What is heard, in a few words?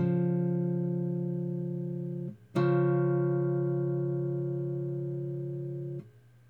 interval d, guitar